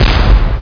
bang med; yoda; PC Gamer 44

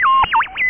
hail

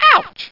ouch; Noddy`s Big Adventure

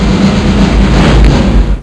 bang bang; Macworld (1997-11); Games World; Shareware Games; Arcade; Peng! 1.0

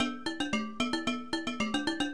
tribal gourd; SGI O2 Out of Box Experience; jungle Island; Cyber Gourds

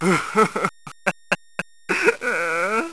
sobbing; Spades Deluxe 1.6; Hal

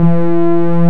analog

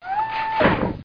Reload Small

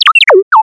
robot idle

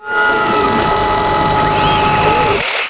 ship arms close; Earth and Beyond; EA Games

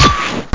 laser; Graliens

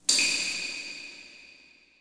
PIPES, pipes

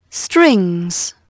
strings; Dorling Kindersley My First Incredible Amazing Dictionary; viol

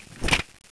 page turn; Curse of Dragor

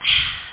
EXHALE

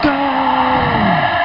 GOAL

